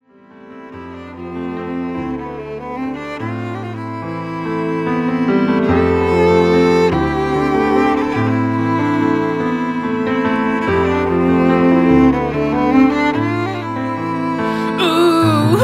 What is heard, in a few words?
piano